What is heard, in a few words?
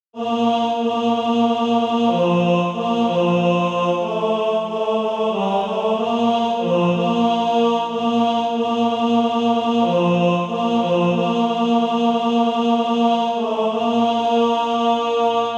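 tenor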